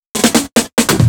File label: Fill 140 BPM